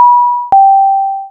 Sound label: intercom